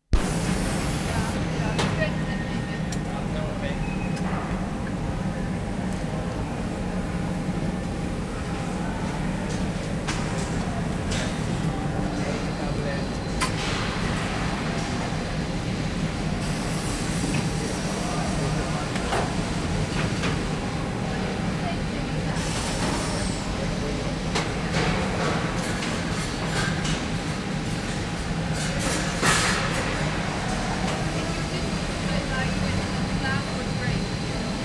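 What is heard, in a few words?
OVEN, GLASS FACTORY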